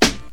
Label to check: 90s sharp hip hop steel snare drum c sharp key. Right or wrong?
Right